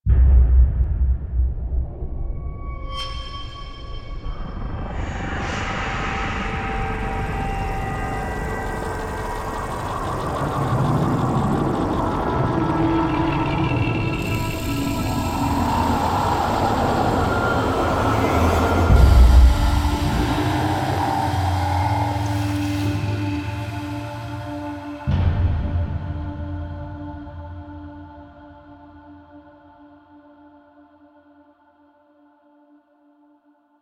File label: Lotus Pod Rise; Apostasy Cinematic